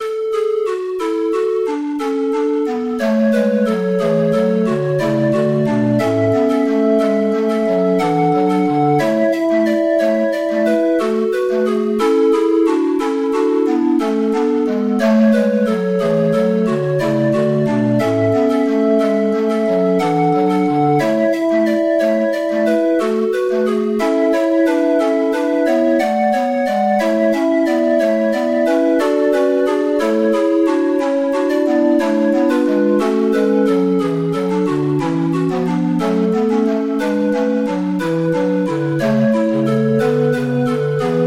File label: organ